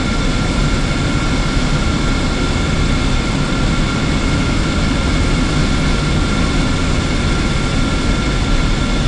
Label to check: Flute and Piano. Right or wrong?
Wrong